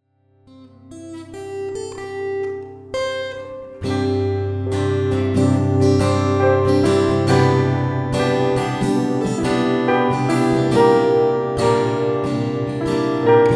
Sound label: Music Box Melody